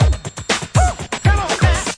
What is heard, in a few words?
DRUM LOOPS and BREAKS, 121 bpm; diva cheese